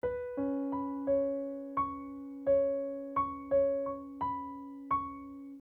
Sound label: Acoustic Piano